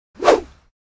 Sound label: Rope swish fast whipping